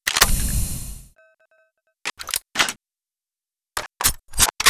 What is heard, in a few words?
heavy cutter reload